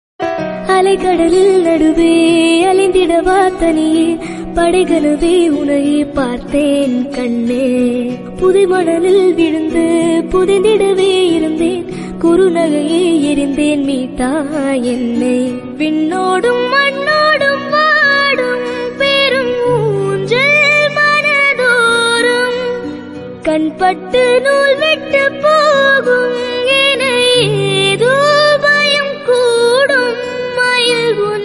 Tamil Song

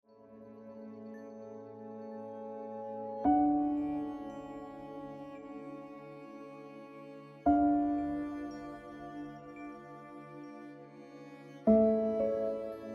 Ringtone Piano Music

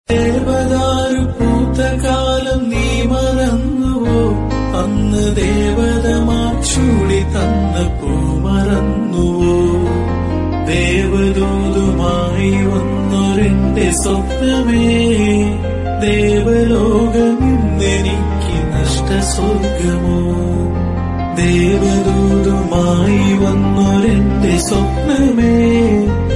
Malayalam Song

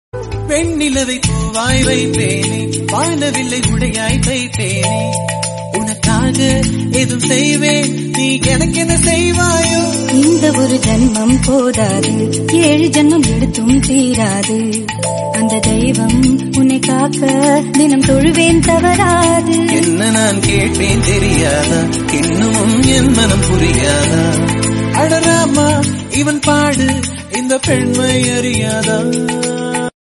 Tamil Song, ringtone

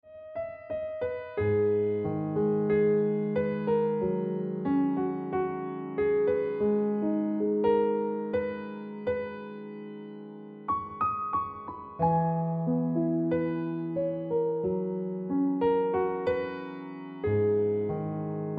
Ringtone Piano Music

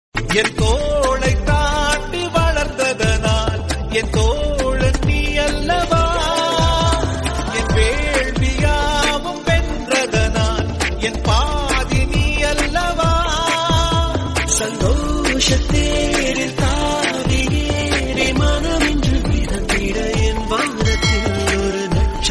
Tamil Ringtone; Kireedam

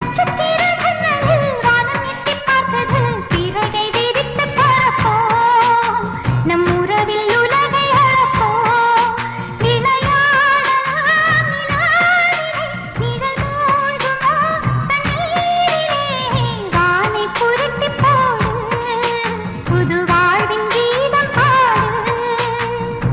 Tamil Ringtone; Suryavamsam

